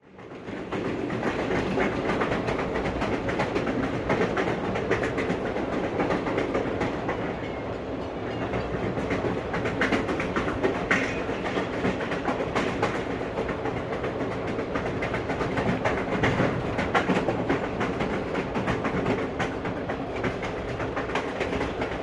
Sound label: Train On Board Perspe